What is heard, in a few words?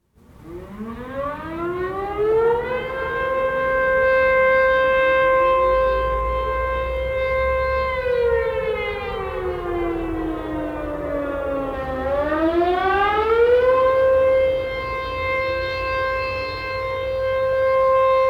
Sound Effect, Sirens